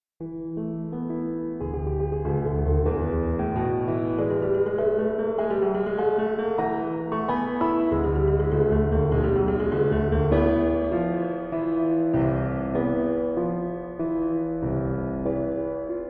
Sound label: Quarter-tone Piano; Quarter-tone Piano Prelude No. 5